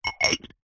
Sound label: household ceramic ramekins x2 stack